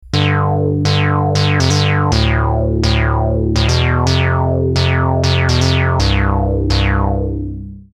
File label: fifths noise